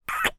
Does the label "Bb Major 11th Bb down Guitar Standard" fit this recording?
No